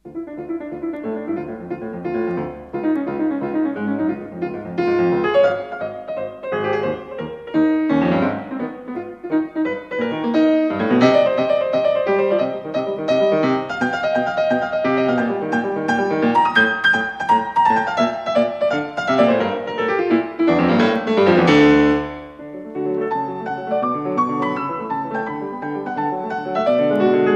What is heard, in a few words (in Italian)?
Tarantella